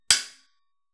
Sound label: ore punch; PixelDefense